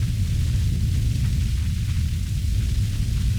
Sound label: Rumble Loop; Misc Rumble Loop